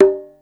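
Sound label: quinto open, Yamaha Motif ES drum machine; 300 Drum Machines; Yamaha Motif ES-Series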